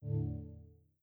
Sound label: Speech Misrecognition, Windows 10; GMM Windows 10